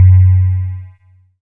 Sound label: SYN, Microwave; Sound & Vision - Gigapack I CD 2